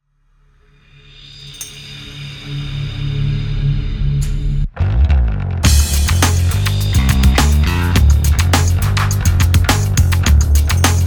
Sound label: Bass